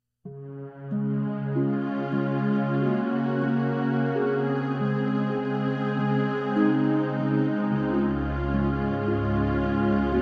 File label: solo vocals